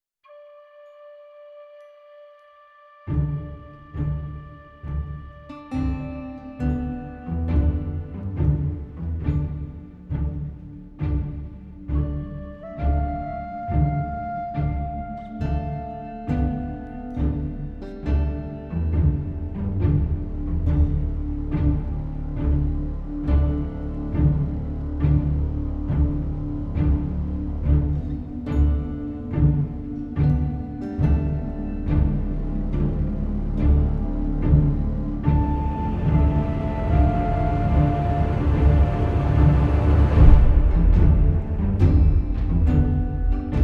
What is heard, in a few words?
Celtic Forest; Titan Quest Anniversary Edition